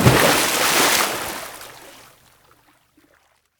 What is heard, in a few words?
Deep Water Splash